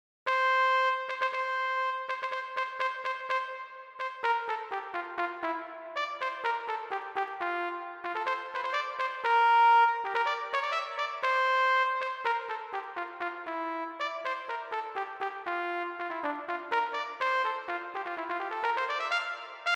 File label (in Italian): tromba